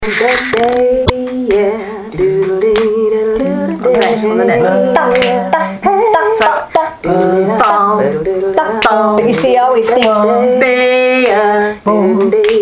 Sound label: riff; Deb's riff